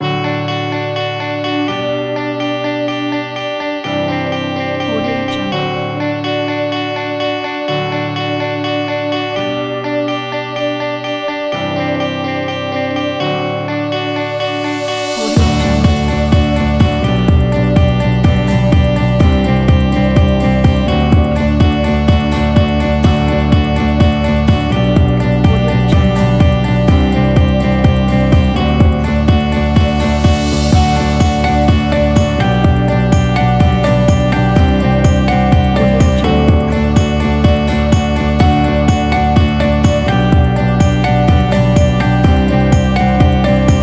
inspiring motivational upbeat uplifting corporate kit